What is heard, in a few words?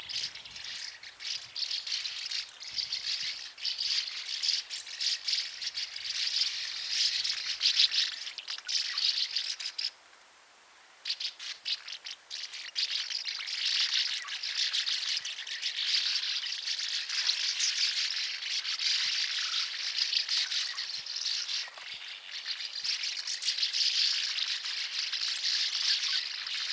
Pale Martin Riparia diluta, call